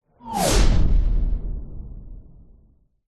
science fiction missile whoosh pass by hit

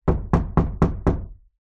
household knocking door wood

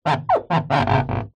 foley glass window rub squeak finger

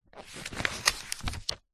household pamphlet glossy thick page turn; zapsplat

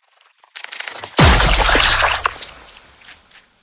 DESIGNED CINEMATIC TRAILER GORE IMPACT; PMSFX

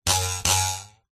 cartoon metal ruler double twang wobble

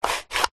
foley wood secret compartment small lid slide; zapsplat

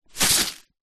rice dry in plastic container tip movement; zapsplat

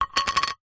biscuit set down on plate

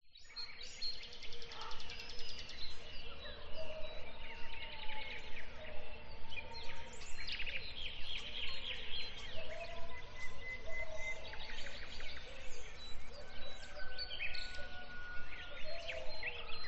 Rural ambience; west wolf

